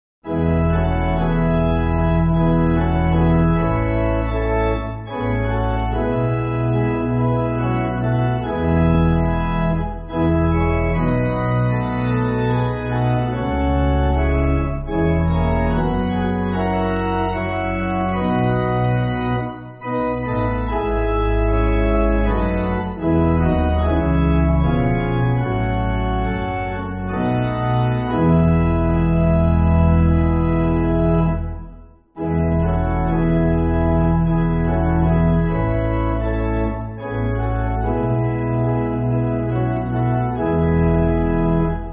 Pipe Organ, church music

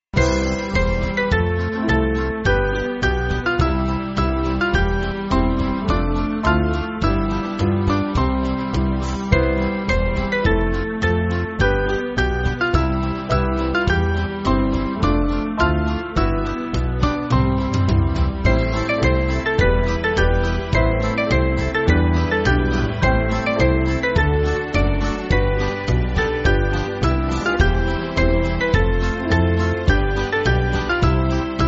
Band, church music; We Eat The Plants; Country Gardens

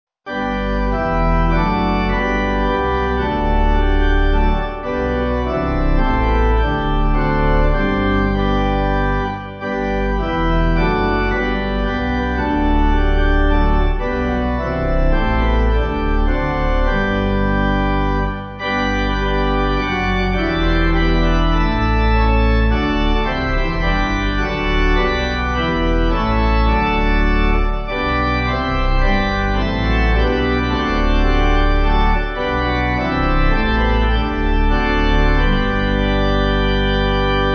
Pipe Organ, Music Backing Files for Churches

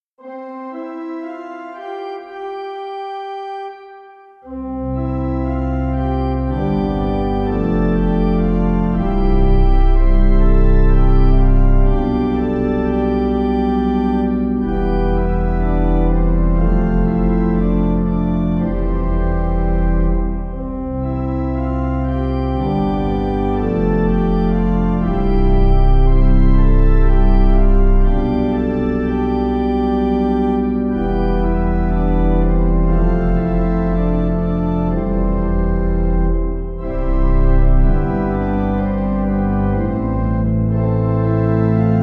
Kyrie, Pipe; Missa De Angelis

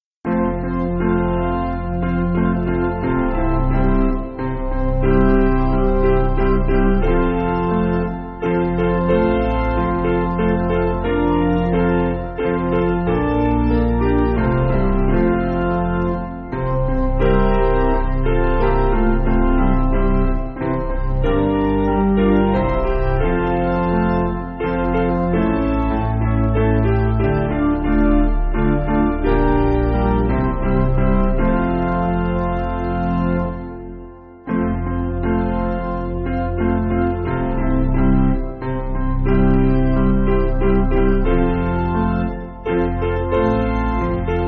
Jesus Refuge Piano; Wetterling

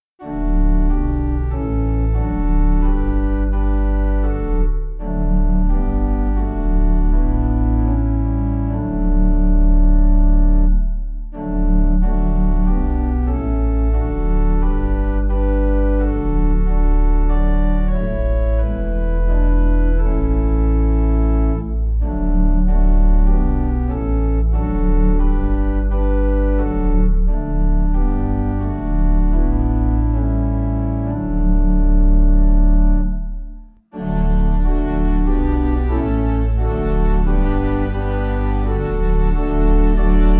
O Holy Spirit, Pipe

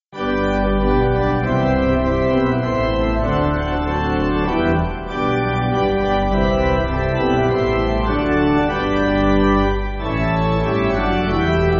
Pipe, Pipe Organ, Music Backing

